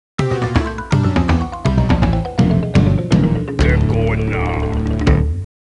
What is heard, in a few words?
Game Over; Jungle Isle; Gex